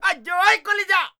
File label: Bihu Vocal Shout; waves of bihu vol 2; Gully Loops